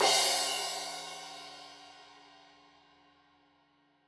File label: Crash 15in L edge, Cymbals Stereo